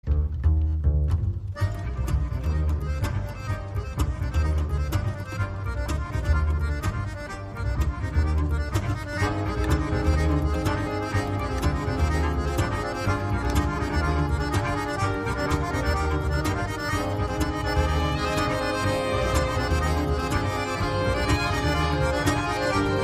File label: Schottisch